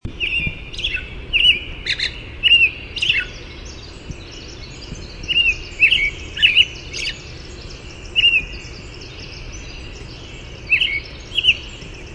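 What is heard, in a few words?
American Robin chirr song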